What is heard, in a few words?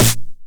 909 SD Tape Sat